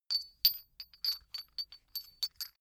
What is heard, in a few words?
small metal handling chink long; industrie wirtschaft; metall